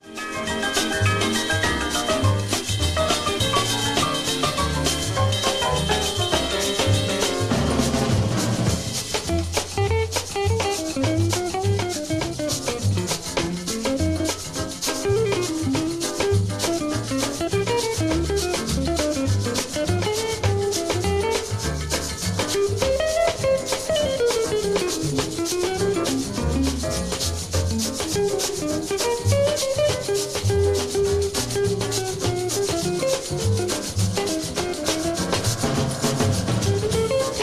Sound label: samba para dos solo